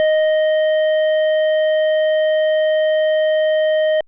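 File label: FM Sq Dist DX100 D#5; square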